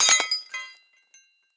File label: break wine glass; break, breaking, glass, hit, shards, shatter, smash, smashing, tinkle